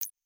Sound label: general button click